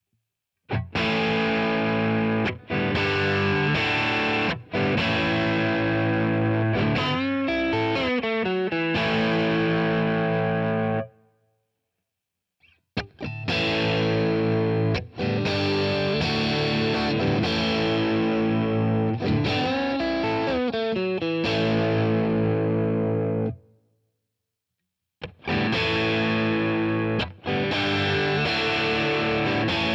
Fender PP Nashville Tele PU Positionen Overdrive

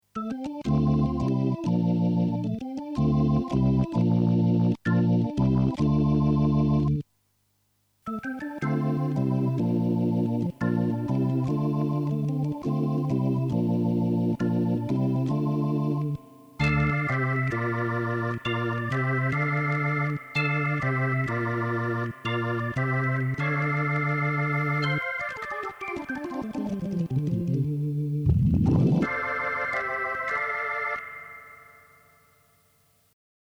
Behringer 646 Orgel dry wet

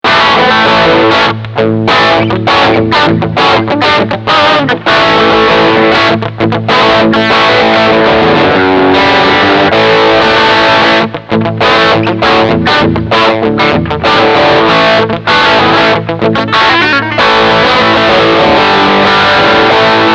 Riff Overdrive Steghumbucke

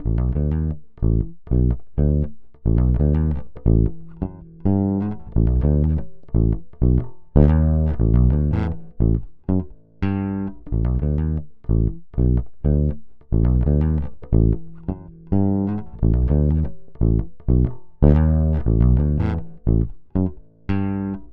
Kompressor Vergleich Original E-Bass

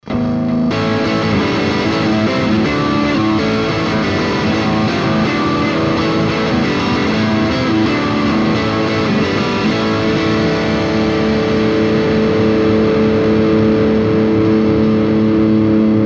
Boss gigcaster 5 gitarre boosted

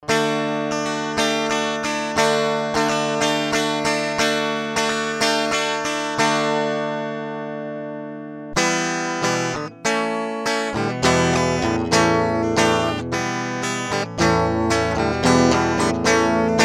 Steinberg IXO22 E-Gitarre

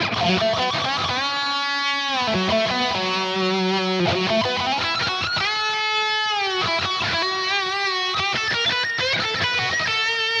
Blackstar Polar 2 Highgain ab 16 Sek Enhance Modus

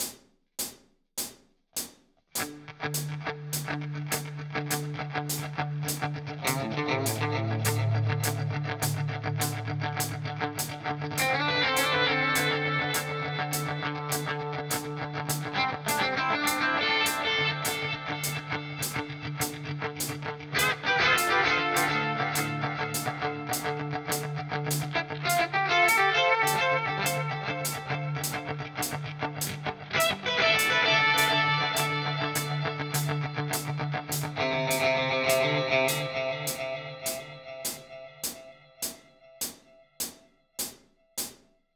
Coole Rhythmen mit Delay 102 BPM Dotted Eight; Brick

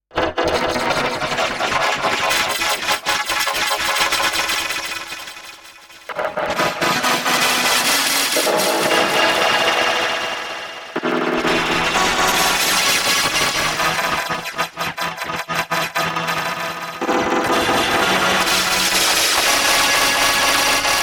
Granular synthese Sample Variation; Emu EIV classic und Ultra

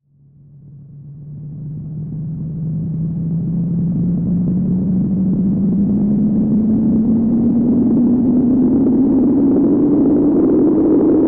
Filter FM mit Noise

